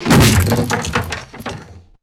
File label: wooden cupboard smashing with debris clattering